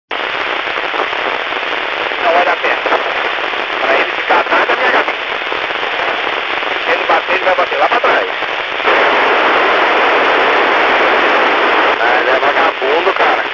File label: milsat